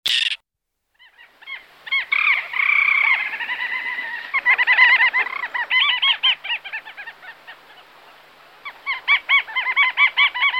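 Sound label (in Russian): lyurik golos, little auk voice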